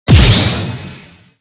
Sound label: Stock Counter